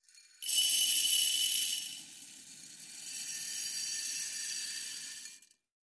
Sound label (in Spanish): arroz cocina vidrio